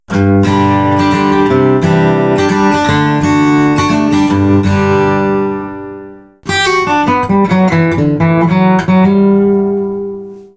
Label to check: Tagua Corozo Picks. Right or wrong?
Right